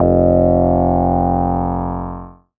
bass F1, EMS VCS3; Polyend; Simulacrum Sounds; 1 Shots; Synths

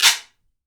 GUIRO; AKAI S6000 CD-ROM - Volume 5

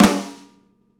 Rock Snare Fl H, Roland BK-5; Snares

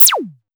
zap; Sonic-Core EDS16-V2

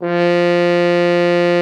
bucket F3; Xsample VOL-3

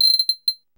GUIRO; DRUMS & PERC

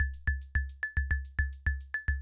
Kay R-12 Rhythmer Loop, Drum Machines; Kay R-12 Rhythmer Sample Pack; 300 Drum Machines

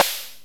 Korg Z1 Blipp

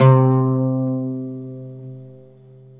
OUD C 3

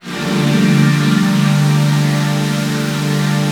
PAD, Drum & Bass, 155 BPM; Ueberschall; Drum & Bass Resonance

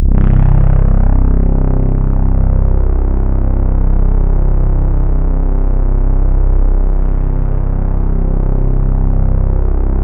C1, Synth Line; 90 s Sample CDs; NiceBeats; SynthLine VOL-26

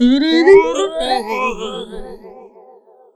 TIBIDI VOX, Funky Vocals, ADLIB MEN; USB Soundscan vol.18; AKAI

